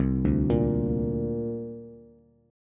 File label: C 7th Suspended 2nd down Bass; C7sus2 arpeggiated; JGuitar